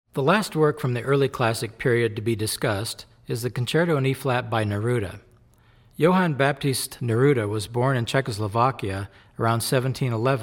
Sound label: Concerto in E-flat Major, Classical Trumpet; Masterclass Series - Classical Trumpet